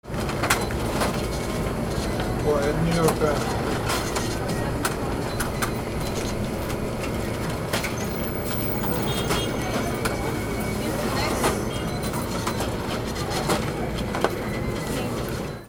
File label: AMBIENCE